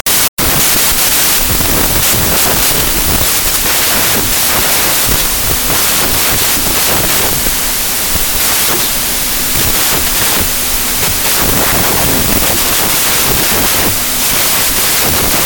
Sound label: Recovery Noise